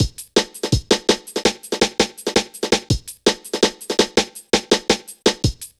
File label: Drum Loops, Jungle